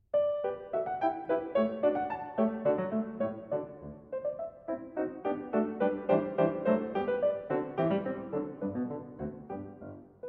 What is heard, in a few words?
piano sonata; Piano Sonata in G Minor; 3. Scherzo